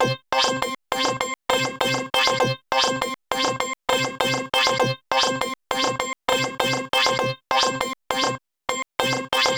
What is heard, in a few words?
Squelch Synth, Electronica Sequences